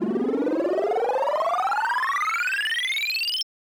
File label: Transition